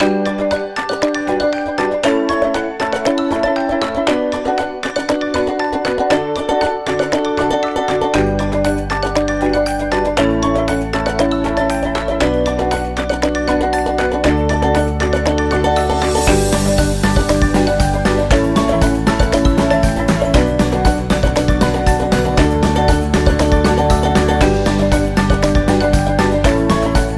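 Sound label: Cooking Vlog, Happy and cheerful background music for celebration videos, birthdays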